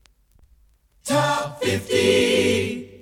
jinglepackage; topformat LP jinglepackage 2

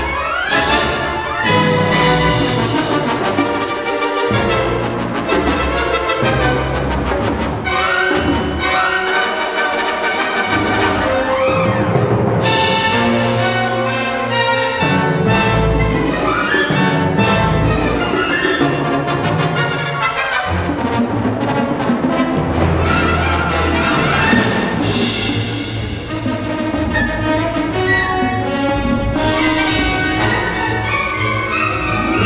loop; sebulba

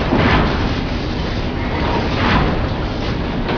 amb machine loop

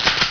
CLICK

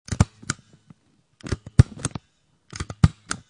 stapler; Office

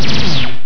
LASER

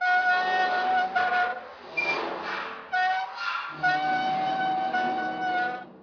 rusty creaks loop

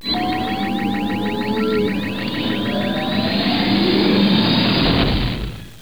death star fire; Star Wars

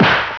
SHOT

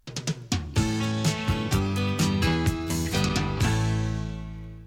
topformat maxi jingle single

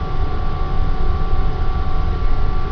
parts rack loop

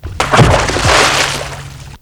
sun Shine Jingle; SunshineJingles

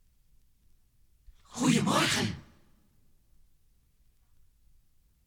topformat maxi jingle single

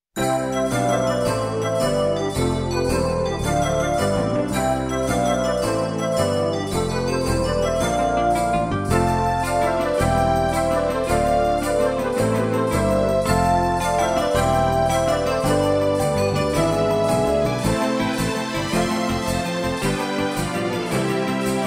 Snowbells; Focus Music

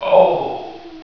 OOH; sound effect

